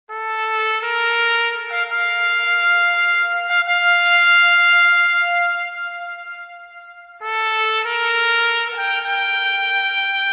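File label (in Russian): труба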